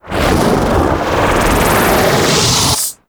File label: Void Angel Teleport Build Up; Abilities; Warframe; Zariman